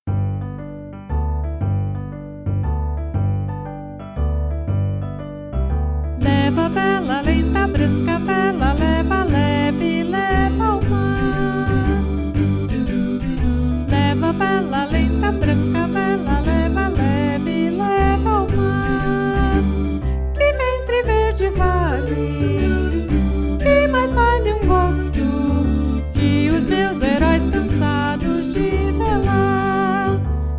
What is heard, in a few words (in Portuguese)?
jogo mare naipe soprano